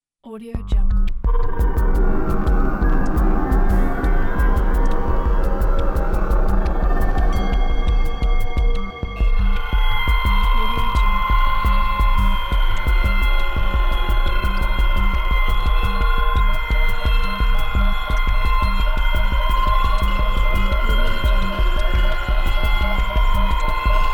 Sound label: horror movie trailer; apollonas